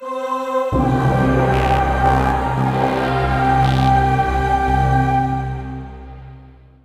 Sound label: Temple Key Collection Fanfare; Metroid Prime 2 Echoes Original Soundtrack (GAMERIP)